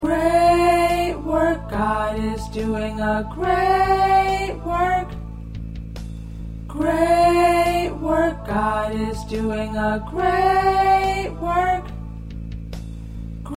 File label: Tenor